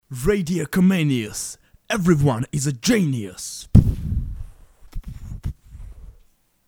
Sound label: jingle